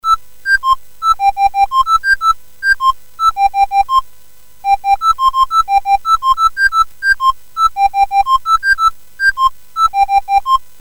CSG8580R5, GAMES

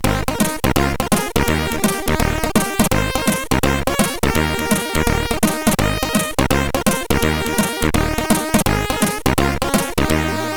Sweety Jingle, CSG8580R5

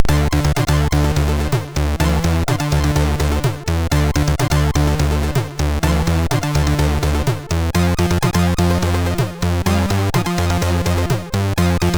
Intro Song, MOS6581R3

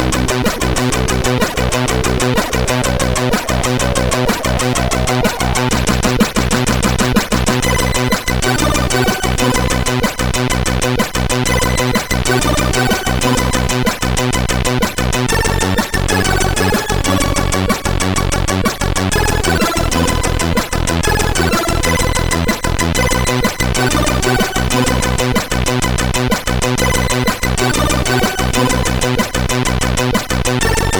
Intro Tune, sid CSG8580R5

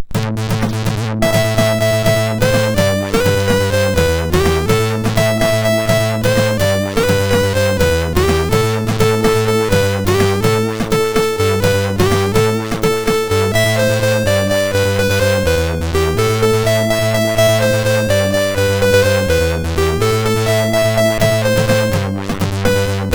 Intro, MOS6581R4; Channel 42

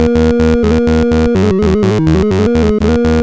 Snare, CSG8580R5

